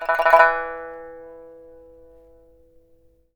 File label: SAN XUAN, E4; Spectrasonics; Heart of Asia